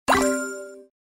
egg drop

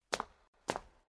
female walk

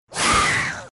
Boss Attack

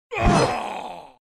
Boss Dead

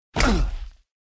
male hurt

